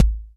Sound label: Kicks, Elektron MachineDrum; SPS1 MKII